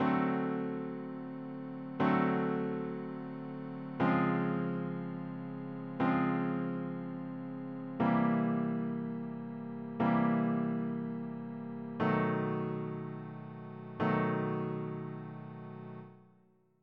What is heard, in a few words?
rhythm changes B alt prog.